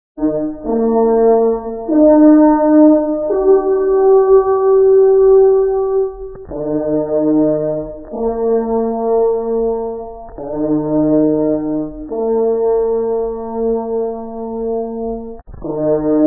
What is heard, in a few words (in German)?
das Ganze Halt, Jagdhornblasen; Signale; Brauchtum